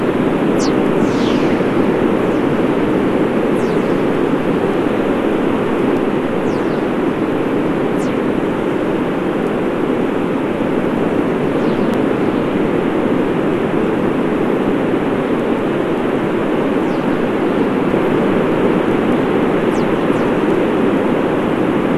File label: WFR audio emfisis